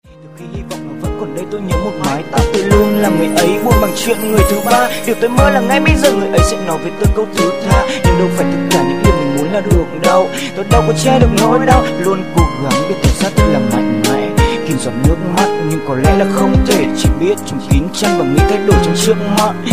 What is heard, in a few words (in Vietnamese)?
nhac chuong RAP HIPHOP